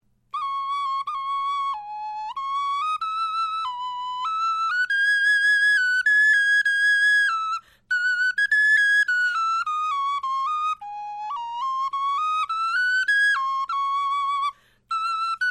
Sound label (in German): melodie